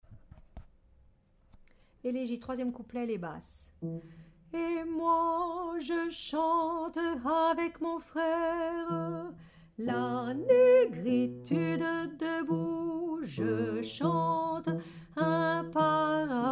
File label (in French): Basse